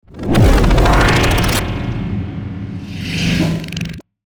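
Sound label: DESIGNED Vacuum; SynthAssault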